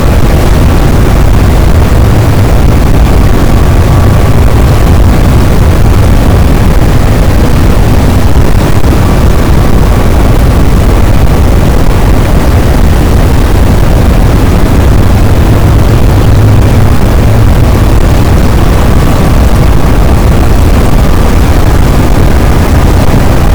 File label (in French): cachalot, boat; Physeter Macrocephalus